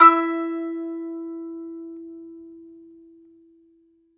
BAGLAMA E05R; E-MU Producer Series Vol. 7 – Old World Instruments

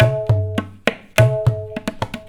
tablas, Indian Traditions; USB Soundscan vol.45; AKAI

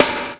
WOLF GATED, Ensoniq ZR-76

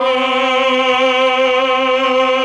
CHOR, Propeller Island Legendary M400

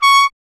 Trumpet C#1; Miroslav Vitous - Solo Instruments VOL-1

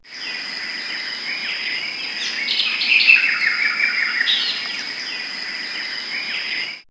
Nature, In D Jungle; X-Static Goldmine

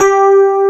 DPF G3, ELECTRIC PF, Piano; ELECTRIC PF#1

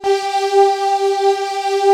STRING SOFTS; Pro-Rec - Synthsonic; Z1 PADS

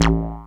Justice Bass, Old Skool House; Big Fish Audio; OSH Bass

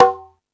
Latin Drums, E-MU Pro-Cussion; drum machine

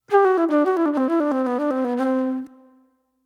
Soul Plate Flute

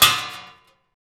FX metal; Sound & Vision - Gigapack II

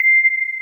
Electric Pianos; Wizoo Electric Pianos

